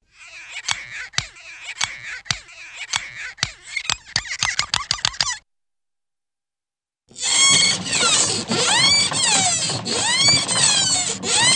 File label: Windshield Wiper Squeaks - rocking Motion Squeaks - Roll; Cartoon; Hollywood Edge; Cartoon Trax